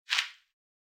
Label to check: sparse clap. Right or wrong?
Wrong